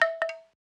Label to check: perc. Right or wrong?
Right